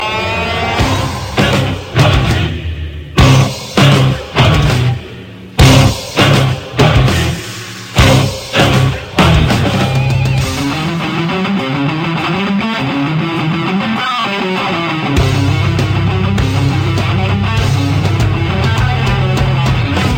rock tone; god of war

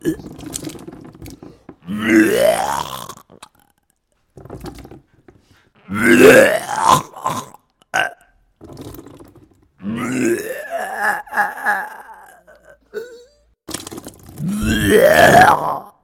alarm vomiting

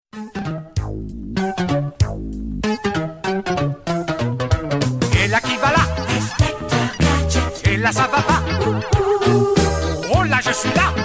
french opening; inspector gadget

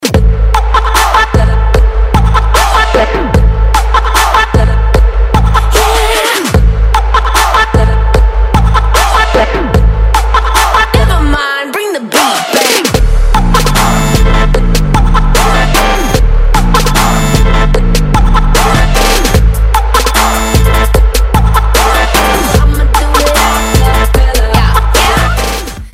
acapella remix

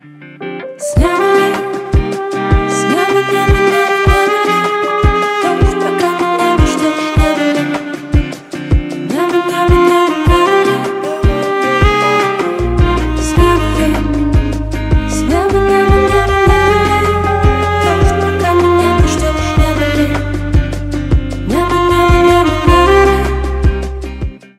Cover Sax

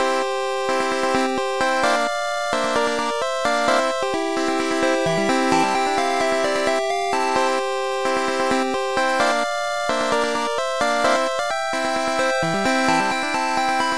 8 bit; pokemon go

